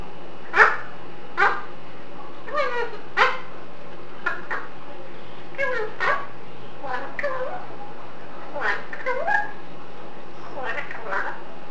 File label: up come out, parrot